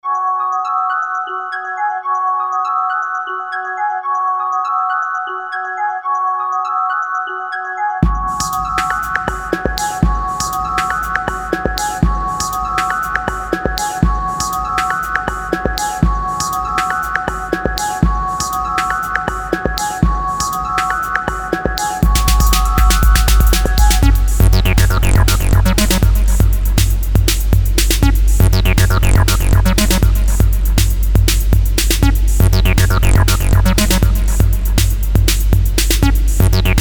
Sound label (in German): Sinus